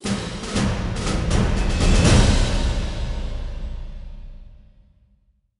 Sting Mission Start; captain america super soldier; nds

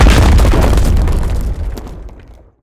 rock crack; ice age scrats nutty adventure

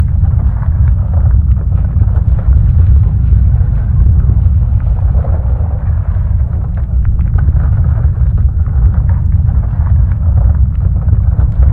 Earthquake; Slendrina X

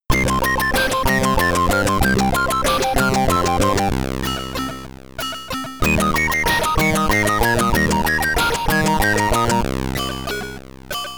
Victory! (SID Stereo); Master of Magic; the Commodore 64

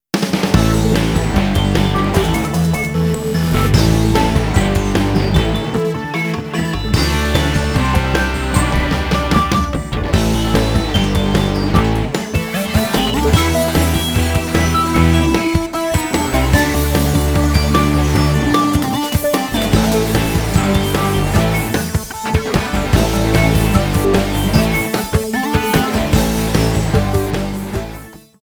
Space Character Intro; Cel Damage; GC

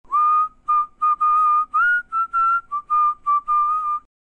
whistle funeral; Black & White

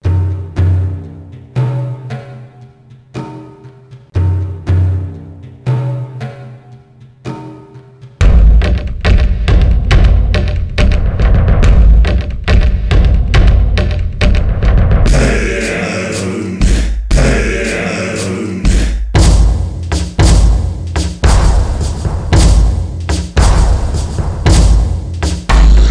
eternal autumn - jem drum

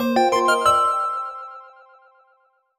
jingle chime positive

